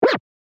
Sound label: Scratch